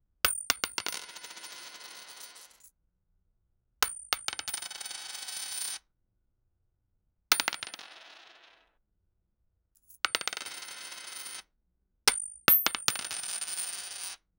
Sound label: coins dropping in arcade